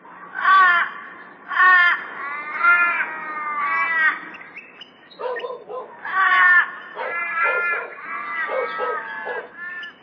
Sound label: Woomera Raven